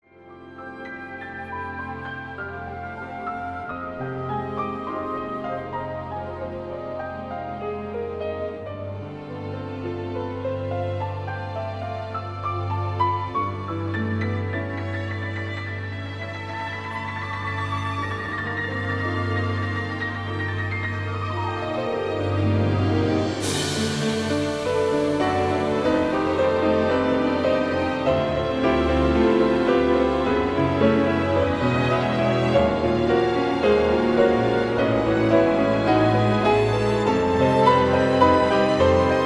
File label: Concertino for piano and orchestra